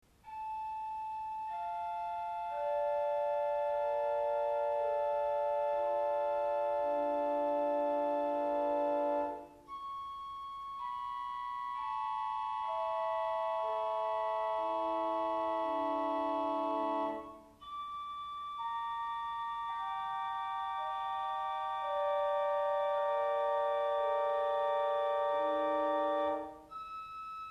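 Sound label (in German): Harmonieflöte 8, Orgelstimmen; Orgelstimmen CD1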